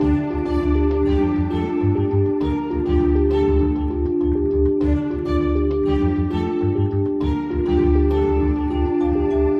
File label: Loop No Drums